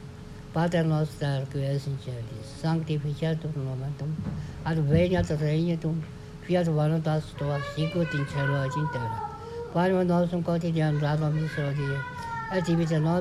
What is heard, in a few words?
Lord's Prayer latin; Cizhong